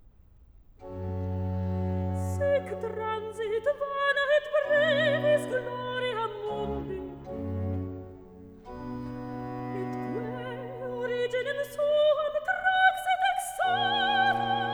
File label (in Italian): Recitativo; Ostro Picta, Armata Spina Rv642; Antonio Vivaldi (1678 - 1741); Vivaldi - Gloria (2009)